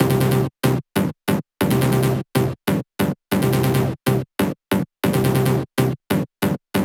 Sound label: 140BPM C; Vengeance - Essential Clubsounds Vol.4